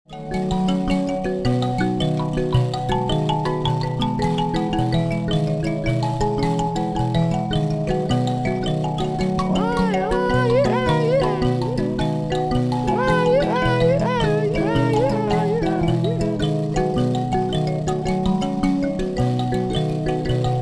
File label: mbira